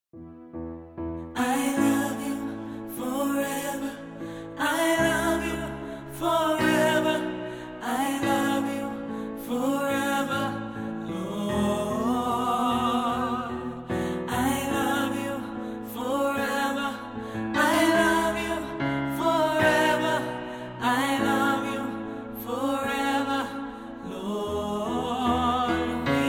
Alles, choir; Presence op het Malieveld

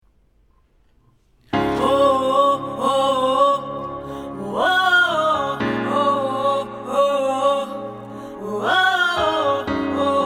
Ten, choir; Presence op het Malieveld

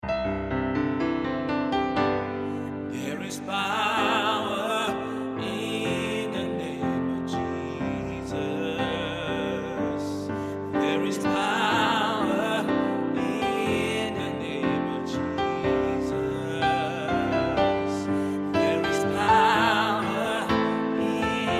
tenor, vocale partijen choir; Presence op het Malieveld

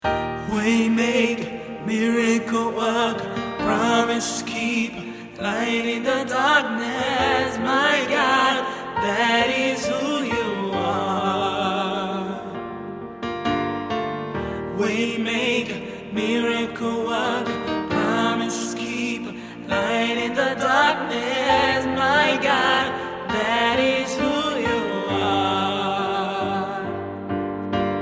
Ten, vocale partijen choir; Presence op het Malieveld

